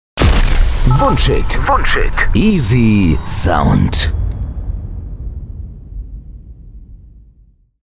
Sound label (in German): jingle; wunschhitt easysound